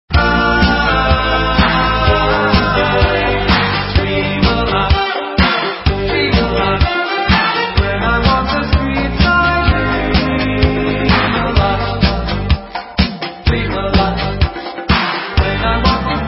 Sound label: lofi back, dream